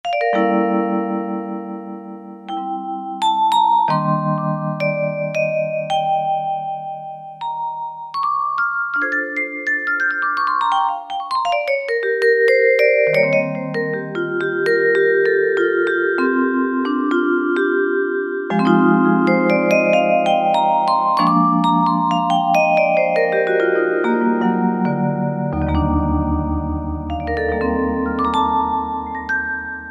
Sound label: Vibraphone, Roland SC-50 Sound Canvas; Factory sounds